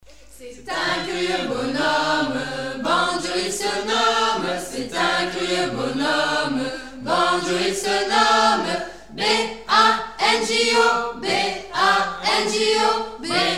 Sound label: Banjo; Chants